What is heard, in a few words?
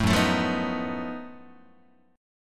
F sharp Minor Major 13th F sharp down Guitar; strummed